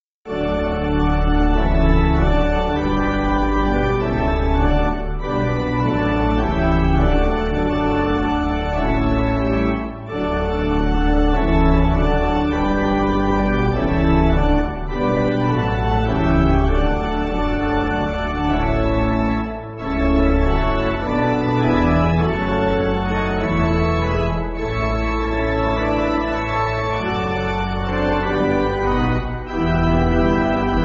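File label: Pipe Organ, church backing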